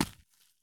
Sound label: soil sprint; Escape from Tarkov